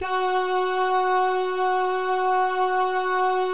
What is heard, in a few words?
DAH, Vocal Jazz FX; Spectrasonics; Vocal Planet; JAZZCOMBO